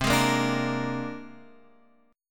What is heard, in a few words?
C 7th Suspended 2nd Sharp 5th, Guitar, Half Step Down; chord